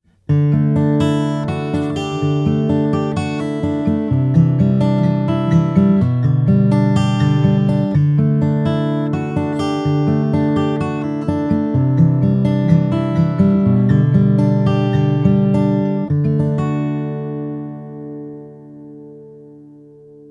Martin d 15m fingerstyle

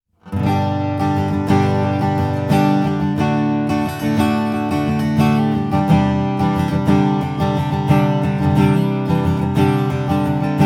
Furch blue deluxe gc cm strumming

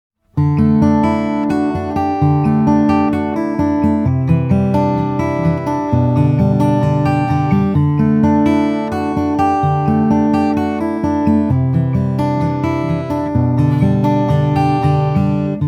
Furch blue deluxe gc cm fingerstyle

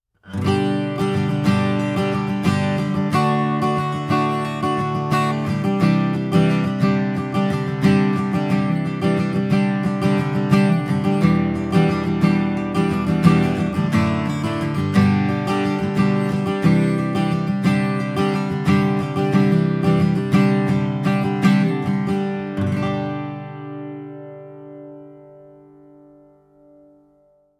Taylor Academy 12e strumming

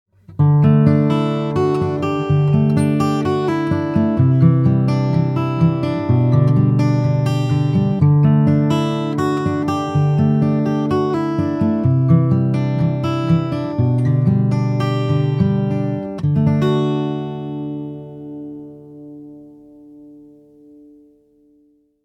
Taylor ps14ce fingerstyle

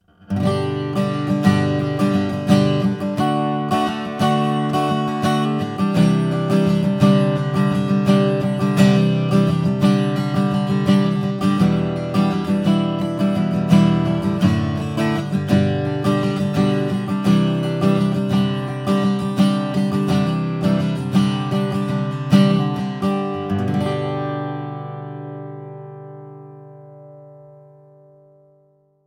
Taylor Academy 10 strumming

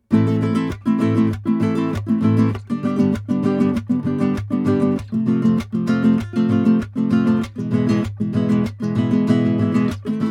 Classical strumming

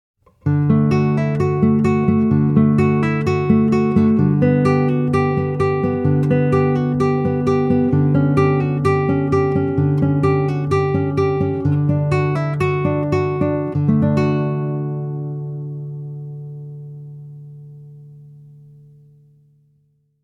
fingerstyle

